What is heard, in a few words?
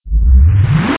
slowmo end; zombie plague